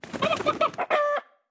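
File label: Chicken, 部署音效; 母鸡; 绿野世界; 卡通农场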